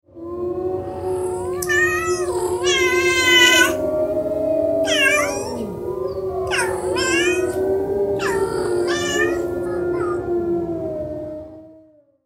duo loup chat; loto sonore; loto des animaux

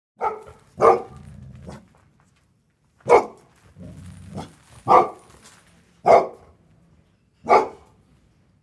chien; loto sonore; pour les petits; loto des animaux

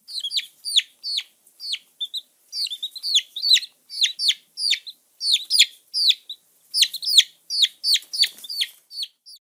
poussin; loto sonore; pour les petits; loto des animaux

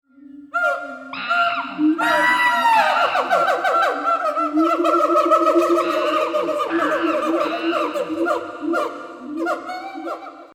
chimpanzes; loto sonore; pour les petits; loto des animaux

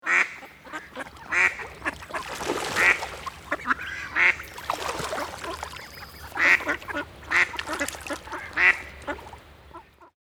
canards; loto sonore; pour les petits; loto des animaux

